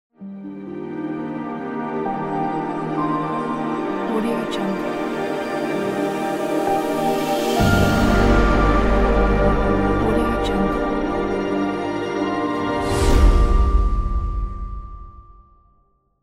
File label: elegant modern logo